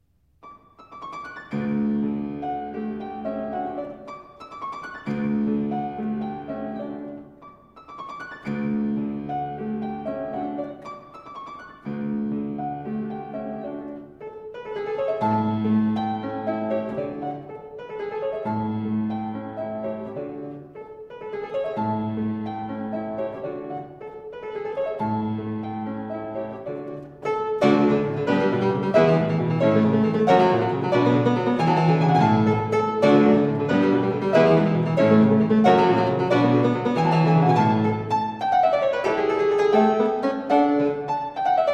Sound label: Bagatelles for Solo Fortepiano; op 119 no 3; Ludwig van Beethoven; Tanya Tomkins and Eric Zivian; Beethoven Piano and Cello Works